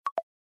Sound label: power disconnected; Infinix; HOT 40i; ui